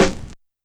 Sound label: Snare Break, YAMAHA RM1X